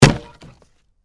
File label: Impact Wood Hit, Sledgehammer, Debris; Hammers; Tools